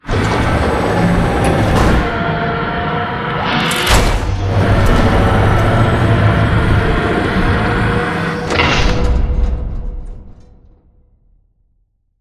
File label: Prop Warehouse Robot Arm Left Cage Grab; Titanfall 2; Boomtown